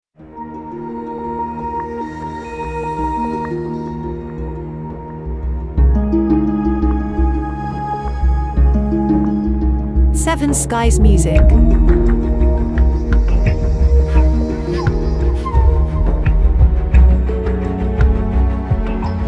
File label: Big Hollywood Dramatic Score